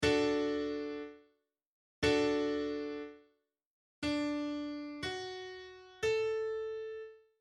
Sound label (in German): dreiklang; Musik; Realschule